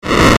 FIRE; pictcode

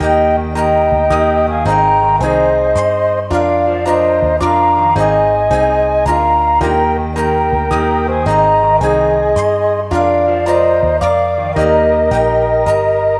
fantasy, bgm; pictcode

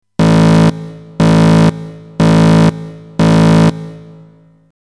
ALERT; pictcode

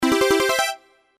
START; pictcode

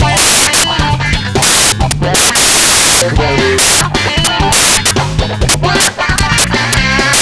rock, bgm; pictcode

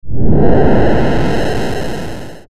FIRE; pictcode

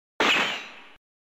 attack; pictcode

